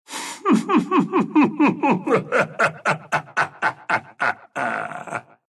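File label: laugh, Russian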